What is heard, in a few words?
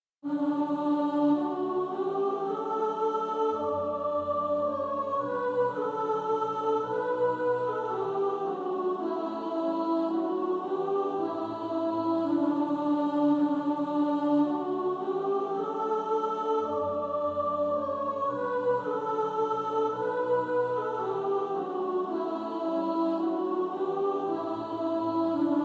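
soprano, practice music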